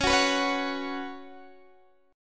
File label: B Suspended 2nd, Db, chord